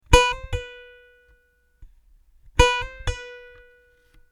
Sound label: hammer-on, ukulele